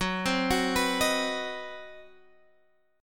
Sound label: C Minor Major 7th Flat 5th Gb, chord